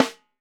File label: tight, snares; Sampleheads - New York City Drumworks VOL-1